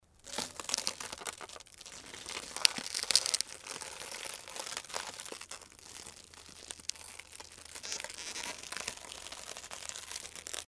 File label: glass laminated twisting